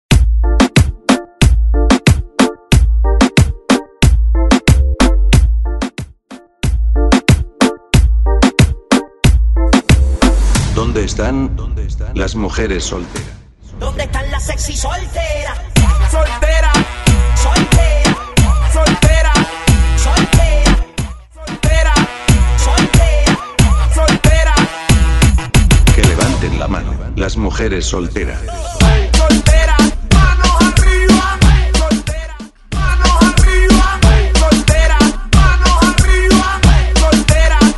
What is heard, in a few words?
92Bpm; DJDOLLS